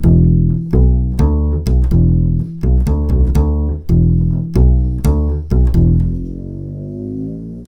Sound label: 125 NuJazz Bass E maj; Pro Tools Essential Loops; Digidesign